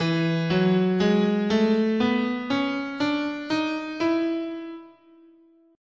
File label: E Bebop Dominant, guitar, scale